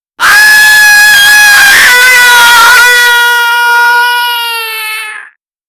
zombie

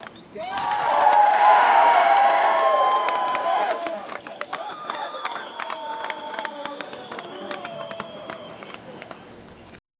crowd cheering in rolling; stadium

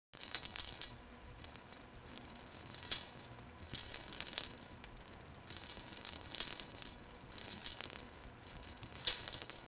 metal chain clanking

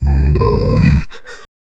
deep guttural demon laugh

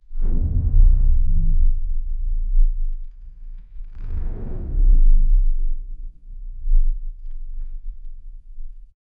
blade draw metallic scrape

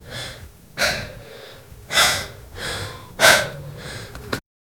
heavy breathing and slow, breathing

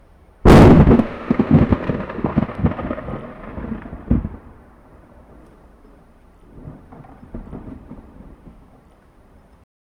sudden loud crack of thunder

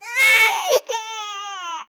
a boy is crying

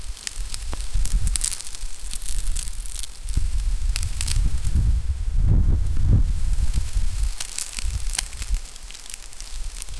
first 6 seconds of wind

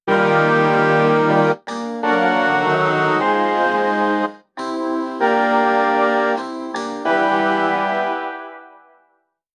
romantic music

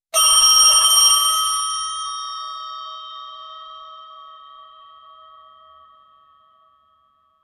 like a 12 oclock, bells

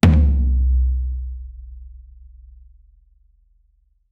after laughter a sudden drum

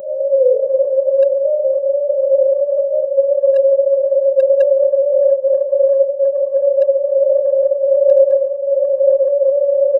ufo

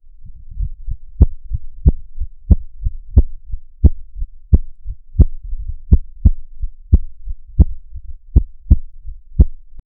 heartbeat slow; hote hote

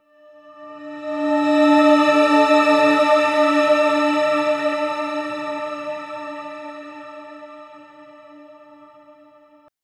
suspense violin drone; musical

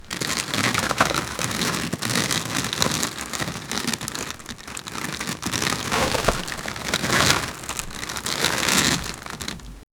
close up sound of dry, agriculture

